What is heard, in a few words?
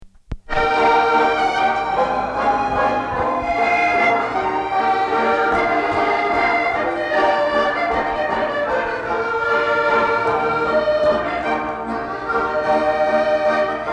Waltz